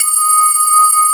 METAL BAZZ

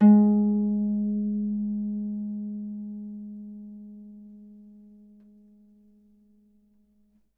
Harp G#3; Miroslav Vitous - Percussion & Harp

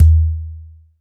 percussion, Roland TD-20

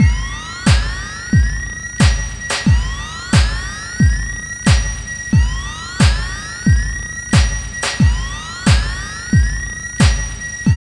LOOP, Tekno Industrial; East West

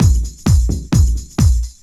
130 tribal, LOOP PERC TRIBAL; DRUMLOOPS

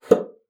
BASSOON; Xsample VOL-6

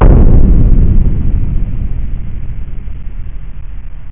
kettle drum; jazz jackrabbit 2; M8

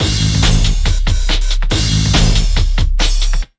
Big Beat Drumloops, 140; USB Soundscan vol.25; AKAI; TECH 140

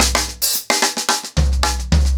110BPM, SNARE TOM SOLOS

